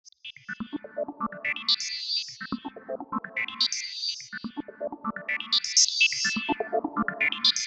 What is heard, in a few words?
Pulsator, 125bpm